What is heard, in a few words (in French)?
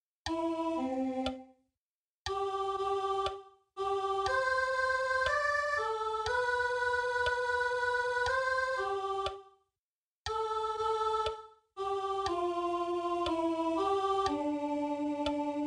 Ecce fiat sop